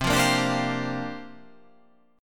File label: C 9th Suspended 4th C down